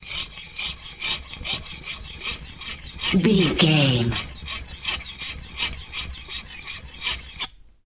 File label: knife cutting metal